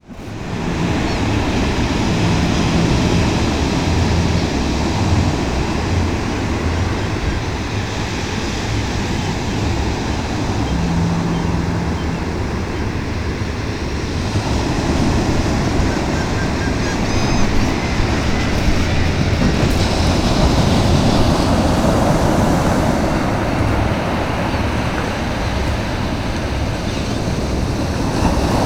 Waves At Ocean City; New Jersey Shore Beaches

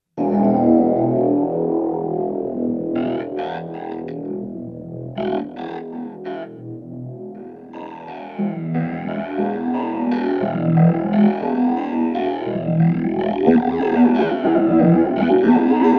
micro macro, keyboards

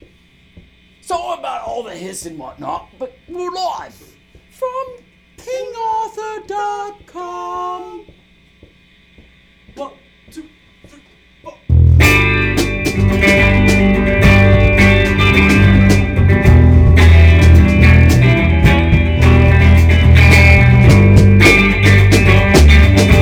End Funk Version

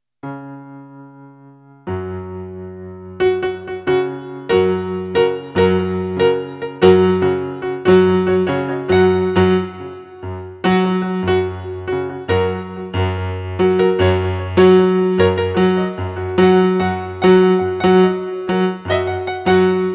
Piano Romp

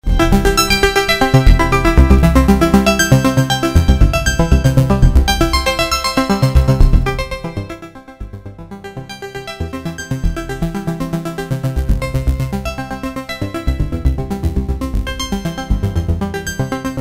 micro exploration, keyboards

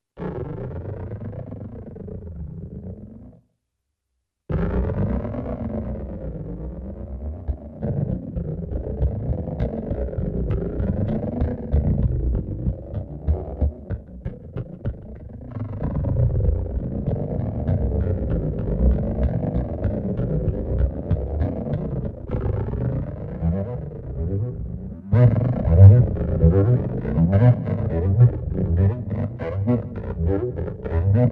micro macro, keyboards

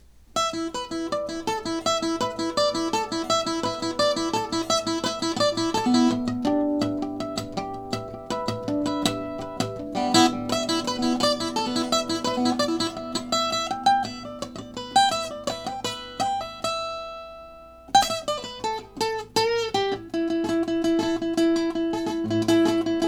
Guitar Jam Ditty

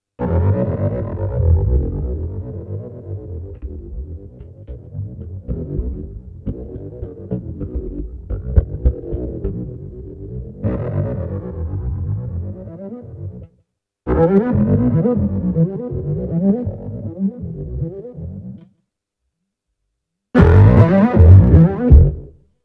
micro macro, keyboards

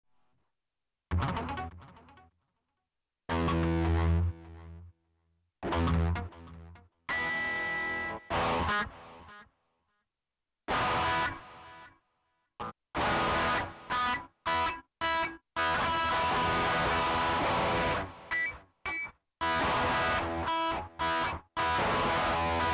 just having fun, guitar

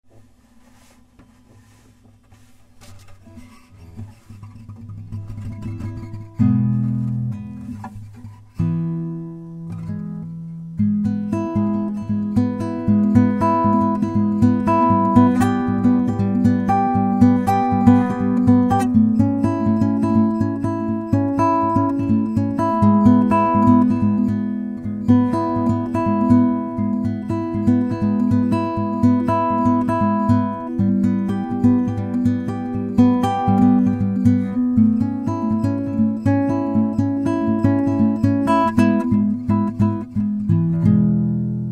spanish interlude

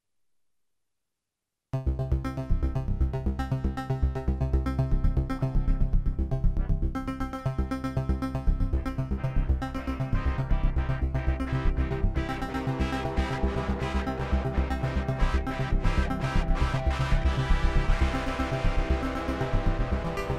micro exploration, keyboards

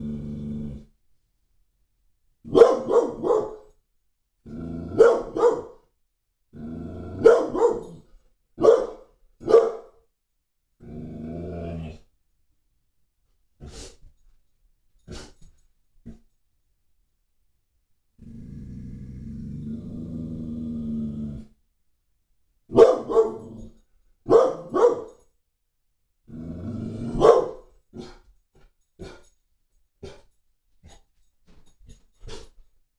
Toucan Bark

